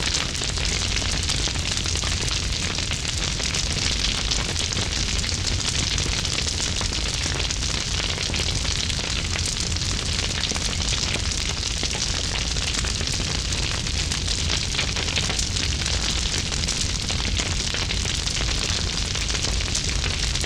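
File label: fire burning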